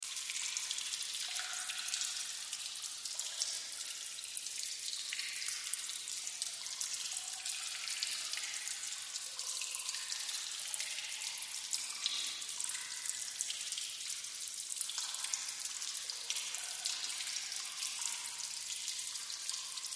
Drips Cave Cavern, Water Drips Cave Cavern Reverb Loop; Dripping; Blastwave